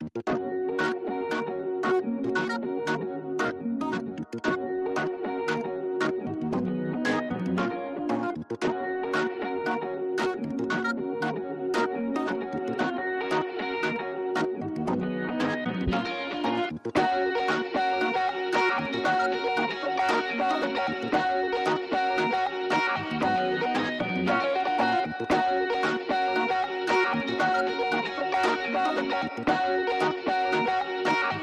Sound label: Guitar and Organ Only